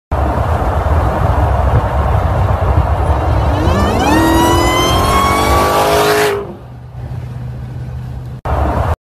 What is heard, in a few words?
Dodge Challenger